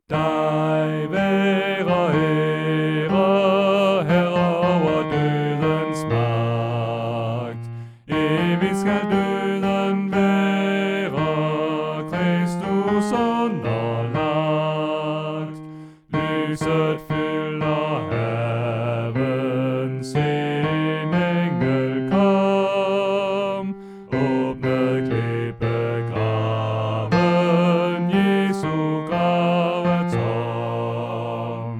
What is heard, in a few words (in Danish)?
Bas; oevefiler